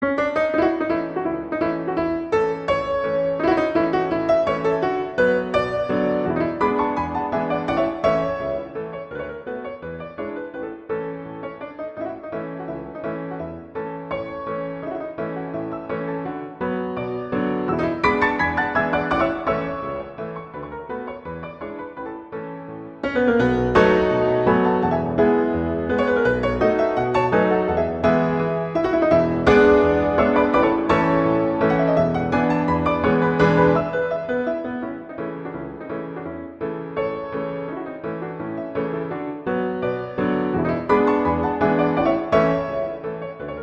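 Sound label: Allegretto, violin; Brahms; Hungarian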